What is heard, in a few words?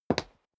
step sneaker wood parquet single right; schritte